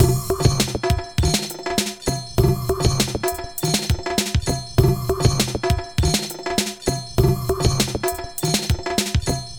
Bongo Jangle Beat; Sonic Foundry (Sony Creative Software); Myths of Technology; Composite Beats

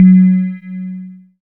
SYN WET FEE, Microwave; Sound & Vision - Gigapack I CD 2

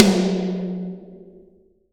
TOMS, Real Mega Drums; Best Service; Real Mega Drums VOL-1

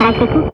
RADIO, 160 BPM; Ueberschall; Drum & Bass Flash Trackz

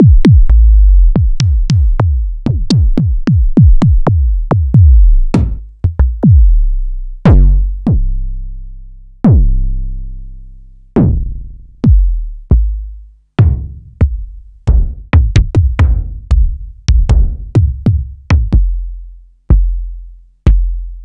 EMU Orbit

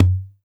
WD, DRM HITS; Spectrasonics; Liquid Grooves

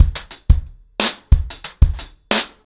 90BPM, acoustic drums, drumloops; The Roots Drumloops

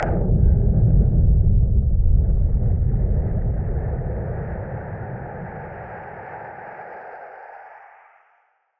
Noise Bomb Boom Filter; musicradar; bomb factory

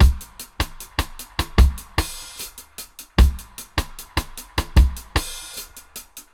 76 VRB, Dub, DRUM LOOPS, 076 BPM; Drumdrops In Dub VOL-1

